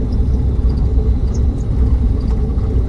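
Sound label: morava cockpit right, engines; War Thunder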